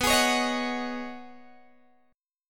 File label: B 6th Add 9th B down Guitar Open G